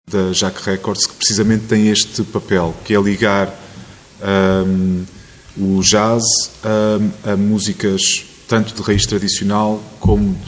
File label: JAZZ